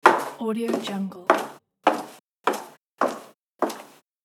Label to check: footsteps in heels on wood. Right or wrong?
Right